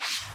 Mosquito Charge Prepare

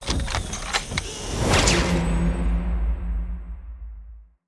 Cheer; starbites

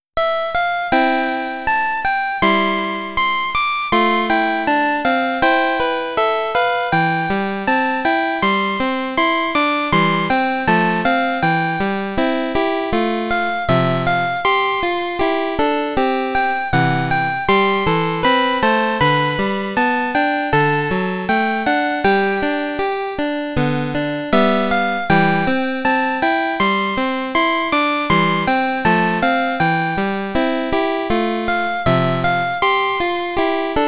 Pokégear Radio - Poké Flute

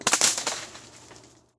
Sfx Golf

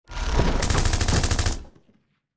Boss Skill Bullet Storm

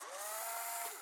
Camera Print; viewfinder

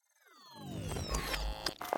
Teleport Power-On Rev; Sx Teleport Power-On Rev 01

